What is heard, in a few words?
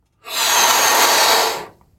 balloon inflate